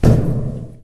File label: metal grate impact soft; physics; Garry's Mod; hl2